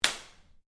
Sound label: Whip Crack Belt Strap; Foley sounds for melee weapons; Objects